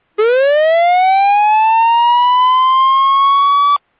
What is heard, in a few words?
Slow Whoop Slow Ascending Low to High, Repeated 424Hz and 1163Hz